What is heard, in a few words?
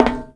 walk metal, FootStep; WarRock